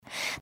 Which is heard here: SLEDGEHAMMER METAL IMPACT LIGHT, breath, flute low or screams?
breath